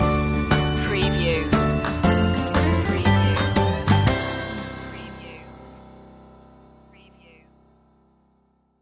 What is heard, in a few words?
closer stinger